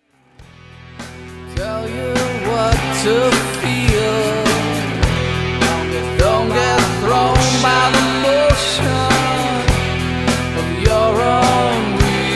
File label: Shake That Trance